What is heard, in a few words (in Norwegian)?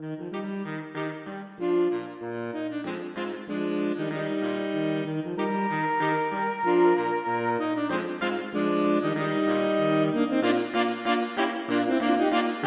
Boure for saksofonkvartett; Aamodt